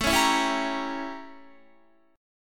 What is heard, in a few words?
A 7th Flat 9th A, Guitar; 16,16,16,18,19,18; strummed